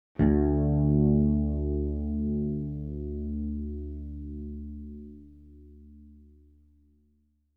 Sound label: bass bright d2; Sound Sampling Collection VOL-5; Drums & Bass Fusion Funk Effect